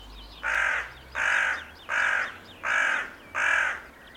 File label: Carrion crow